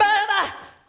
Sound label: "baby" SUNG